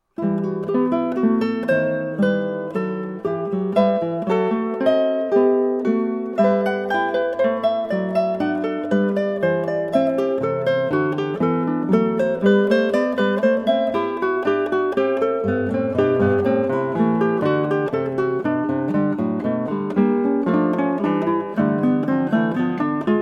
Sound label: Prelude 18 Book 1 Well-Tempered Clavier, 8 string guitar; BWV 863; JS Bach